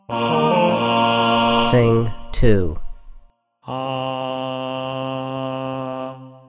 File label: A# minor Voice Tenor; contextual ear training voice edition